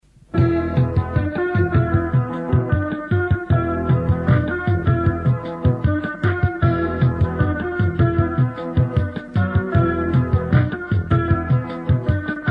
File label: Samba